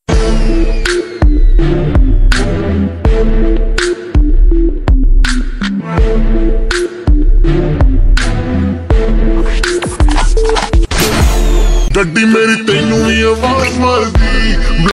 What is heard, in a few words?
ringtone boys attitude bgm ringtones